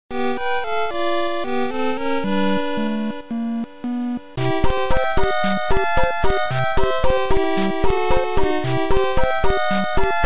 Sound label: Underwater Theme; Super Mario Bros 3